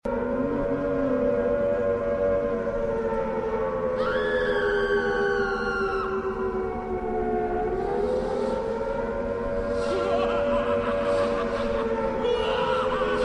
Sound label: ambience, zombie plague